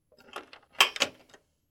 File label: door handle turning; SoundsCrate